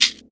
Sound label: shaker one shot a sharp key; percussion